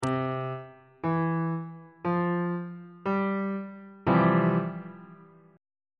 e sharp major 7th suspended 2nd 2nd inversion on bass clef